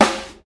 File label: snare g sharp key, Royality free snare single hit tuned to the G# note. Loudest frequency: 1497Hz; snare drum